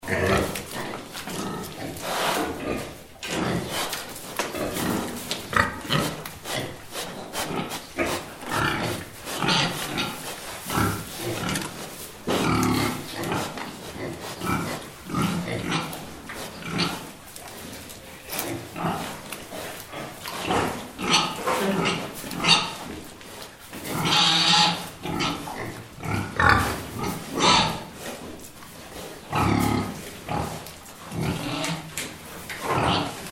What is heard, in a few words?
pigs oinking